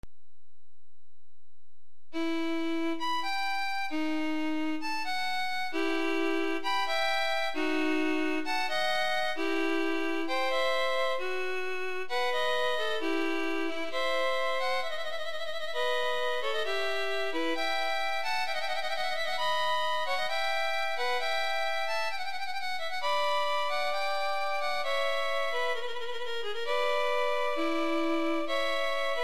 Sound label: violin adagio both parts; Telemann Canon Sonata; no. 1 in G major; for two violins or two violas